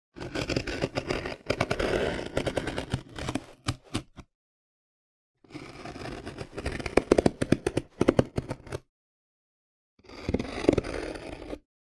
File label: Cut cardboard